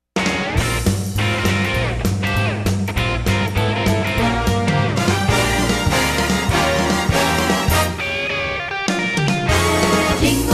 50s 60s Band; Los Angeles 50s 60s Band

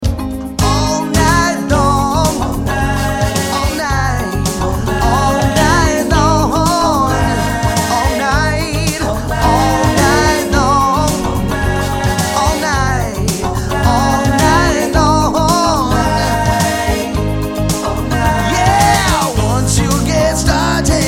Cover Band

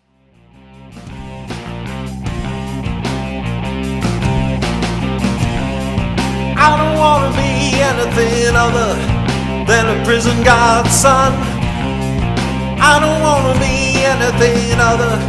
Cover Band; Omaha

